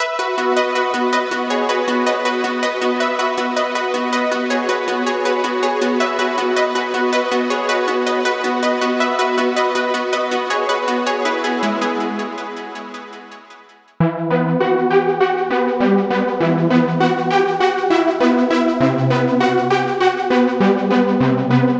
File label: Synthwave Synth Pop